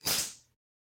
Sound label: snake attack; soundscrate